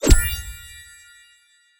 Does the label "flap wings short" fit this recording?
No